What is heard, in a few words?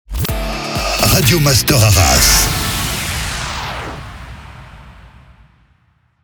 Jingle MEDIUM; Radio Master Arras; Habillage